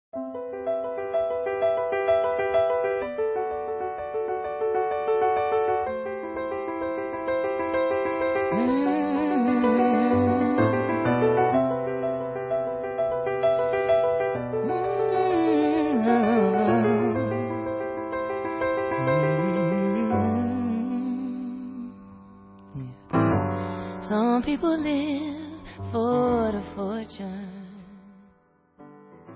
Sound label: piano vocal version